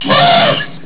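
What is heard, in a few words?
Elephant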